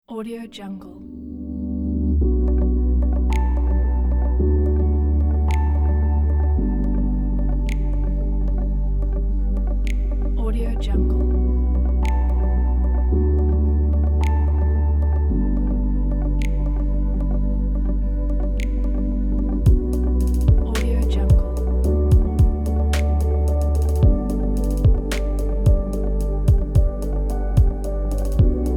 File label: chill ambient technology